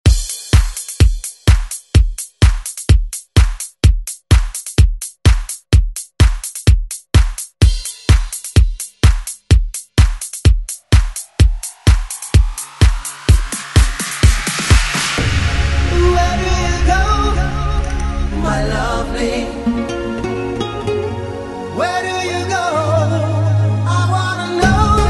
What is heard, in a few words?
ReDrum